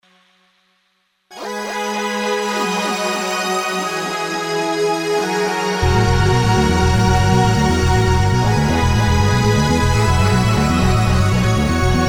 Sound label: Shaker